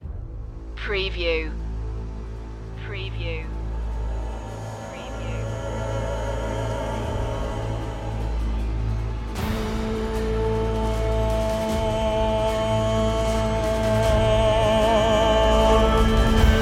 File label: intro choir